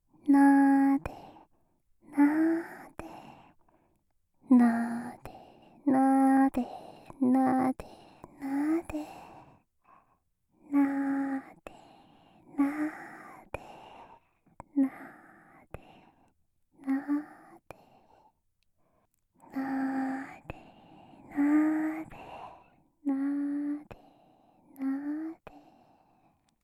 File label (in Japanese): なでなで